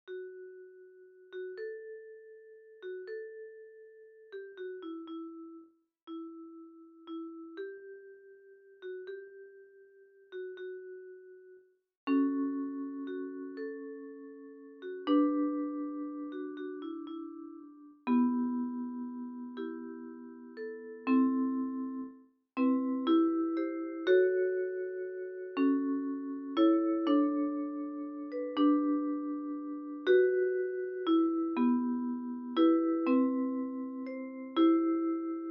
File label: birds